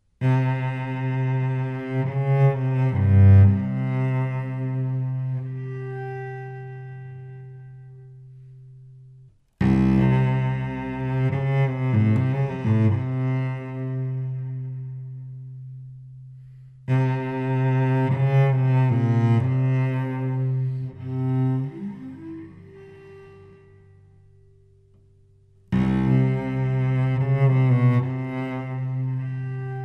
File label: cello